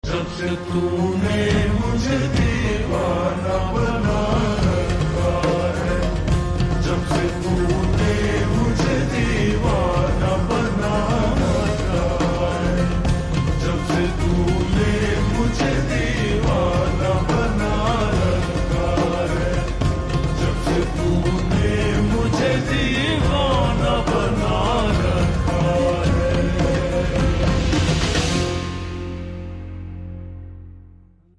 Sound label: Ringtone Slowed Reverb